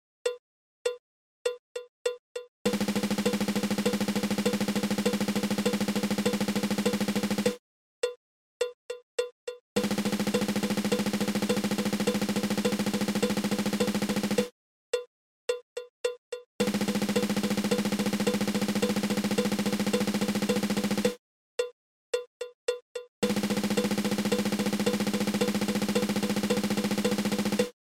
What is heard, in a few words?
Single Stroke Roll